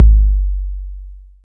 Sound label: 808 K Long; Roland MV8800; TR-808MKS